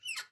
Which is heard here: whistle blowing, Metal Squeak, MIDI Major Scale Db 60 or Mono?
Metal Squeak